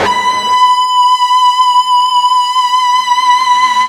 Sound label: FUZZ B 4, ULTRA FUZZ, Guitar; East West; Steve Stevens Guitar Samples Collection